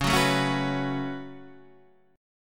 C sharp 7th Suspended 4th Sharp 5th, C sharp, down